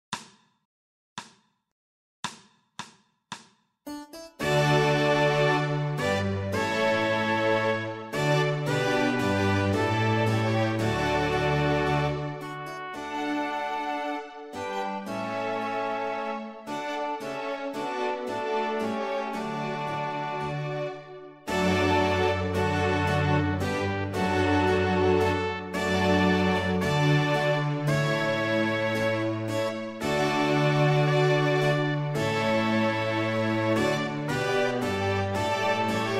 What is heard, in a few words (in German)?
nur Begleitung